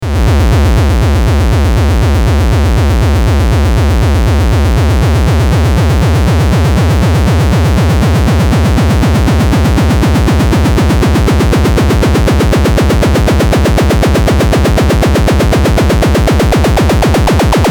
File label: dist long